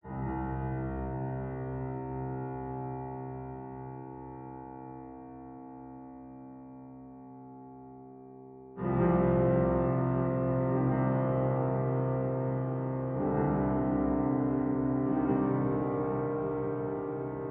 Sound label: piano wet